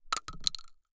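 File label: Weapon Bullet Shell 12 gauge Drop On Dirt; AdobeCC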